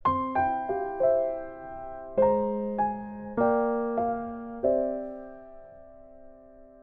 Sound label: Sleeping; edge of eternity